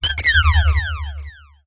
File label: science fiction laser gun or beam fire